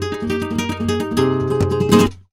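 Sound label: Flamenco Guitar phrase g#; Best Service ProSamples vol.46 - Flamenco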